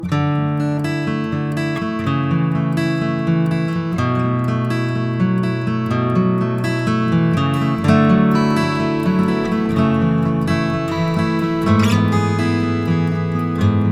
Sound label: Deep Thoughts no pads